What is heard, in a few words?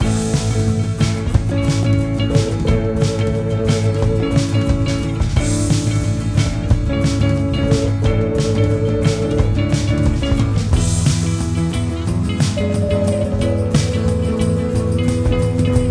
Hip Stir Café (Speed Up); Cooking Dash